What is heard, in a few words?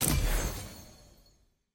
ui champ button click